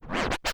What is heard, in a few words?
Cuts, Vinyl Record Samples